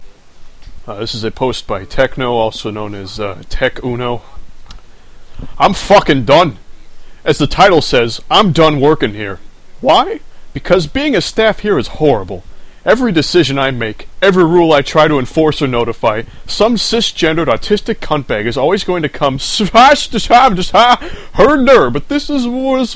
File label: tekuno